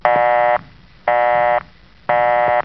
Busyfone; Cheesy Lo-Fi Sound Effects

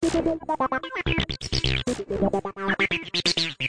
acid fun loop; SYNTH AND ELECTRONIC LOOPS

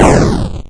8bit kit explosion; LO FI

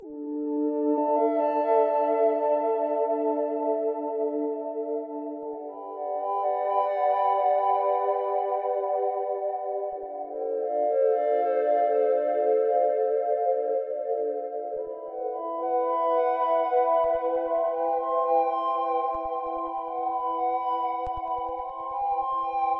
ambient peaceful paradise pad journey; Pads

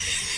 subway sound; Subway Sound Construction Kit

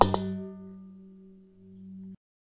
kitchen lamp strike; Weird and Interesting Hits

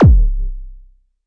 Arturia MiniBrute kk

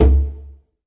djembe big hit; ETHNIC and WORLD PERCUSSION

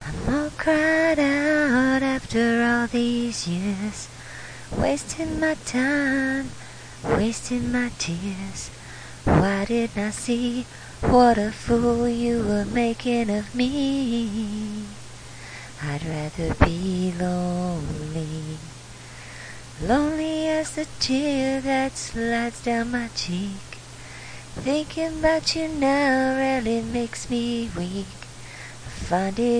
acapella lofi; VOCAL ACAPELLAS

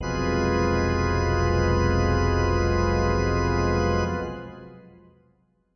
Heavy Pipes F, Heavy Pipe Organ

